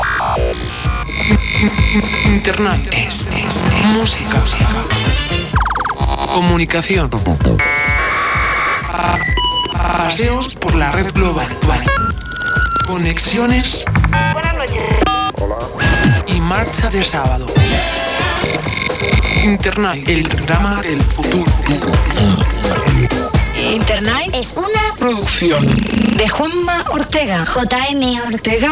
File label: jingle; Internight; radio